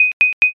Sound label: timer done